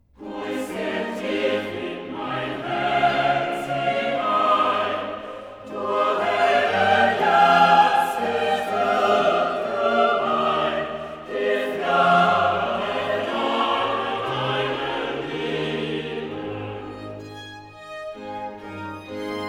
Choral Tutti; Geu sehr tief in mein Hertz hinein; Buxtehude; Opera Omnia; Vocal Works

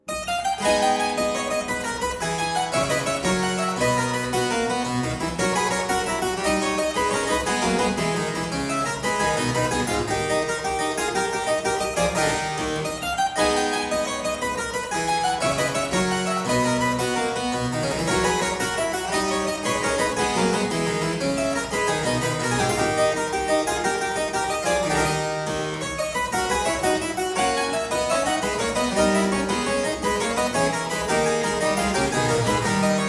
Courante in A minor - Variatio, harpsichord; Buxtehude; Complete Harpsichord Music; Courante zimble; BuxWV245; Variatio 8

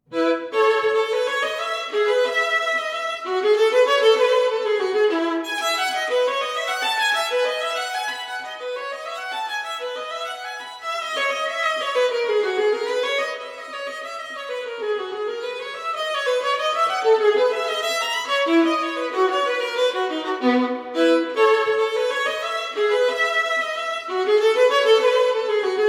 Partita in E major - Bourree, Solo Violin; Baroque; J.S. Bach; Sonatas And Partitas For Solo Violin; BWV 1006

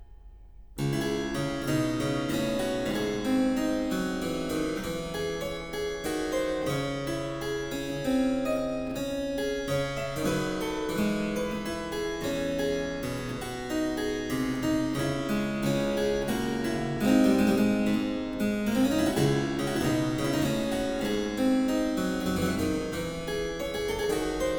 Suite in D Minor V. Sarabande, Harpsichord

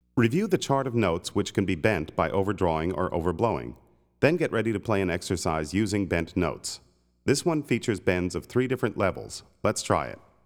Riffs, harmonica; Play Harmonica

